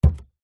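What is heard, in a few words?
foley footstep single on wooden floor barefoot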